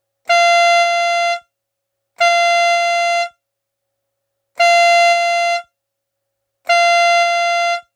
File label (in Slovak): klakson 12v podtlaková fanfára